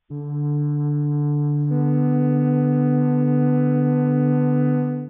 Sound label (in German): flöte d1 h1; Intervalle, die man durch Potenzen von 2 und 3 darstellen kann